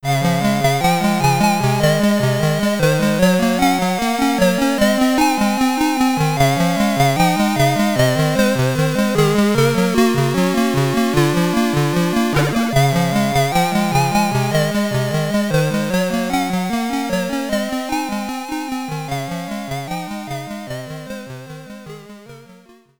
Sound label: Event (SID Stereo); Monopoly Deluxe; Commodore 64